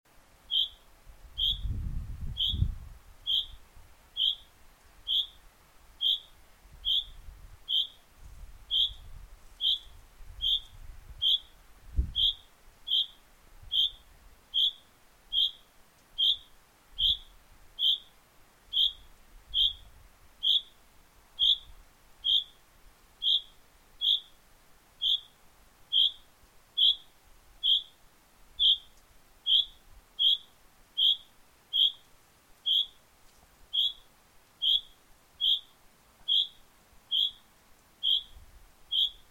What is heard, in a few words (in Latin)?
Fringilla coelebs; SETE